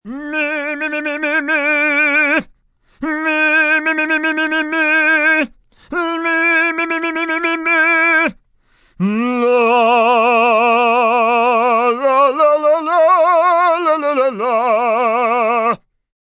Singing Meemee, Vocal warm-up, singing, male, long, comedic opera warm-up, voice cracks; human voice; Funny Sound; comedy